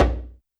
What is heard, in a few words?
Djembe Mute; YAMAHA RM1X